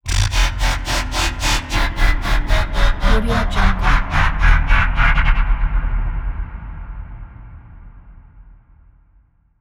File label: bloody nightmare zenith stingers; soundmorph